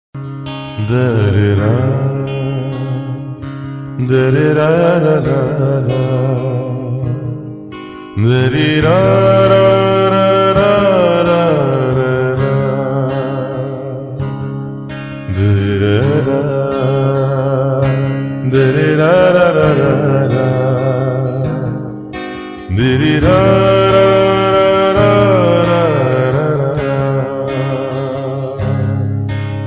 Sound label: Bengali Folk Ringtone